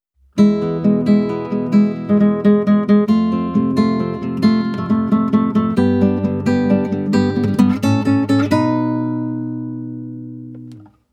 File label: World Clear Fanfare, guitar; Super Mario Bros.; super mario series for guitar 2010